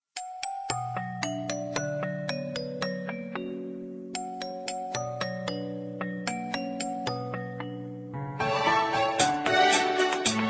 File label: android mobile ringtone